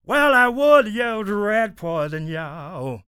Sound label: SING, Blues Soul; Spectrasonics Vocal Planet